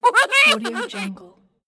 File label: a silly cartoon laugh